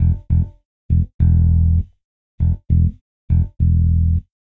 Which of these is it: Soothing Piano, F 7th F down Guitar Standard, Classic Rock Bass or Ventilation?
Classic Rock Bass